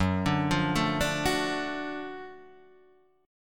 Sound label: F sharp Minor Major 7th Sharp 5th F sharp down Guitar Half Step Down